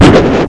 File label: hyubu; みんなでバトル